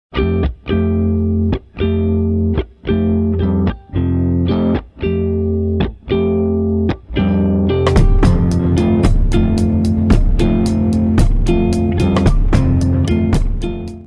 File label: underwater waves